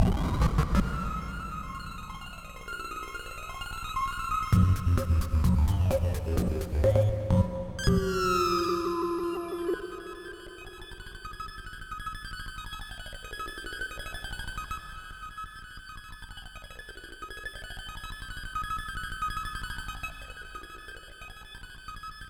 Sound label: Jingle Game Over; Dr. Mario